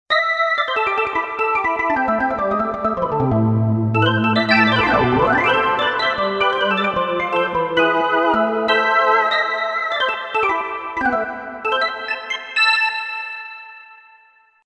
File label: organ